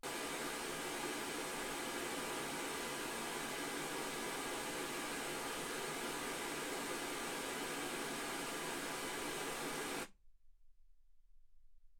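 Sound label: white gaussian noise 48kHz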